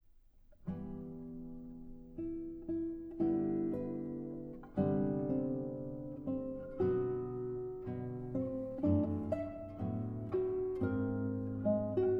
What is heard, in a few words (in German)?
Gitarren Duo